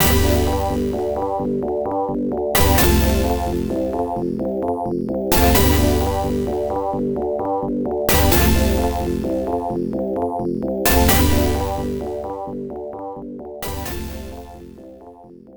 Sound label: Orchestra Loop; shooting tsukuru V; IBM PC/AT